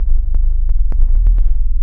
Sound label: Bass Line; Eclectic Electronica